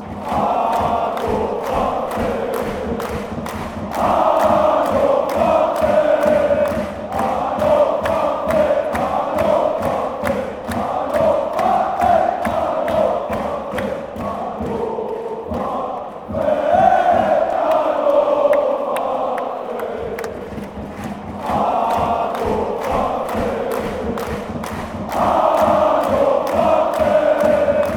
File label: schalke 04 chant